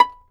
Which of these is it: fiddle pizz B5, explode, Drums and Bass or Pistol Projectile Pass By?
fiddle pizz B5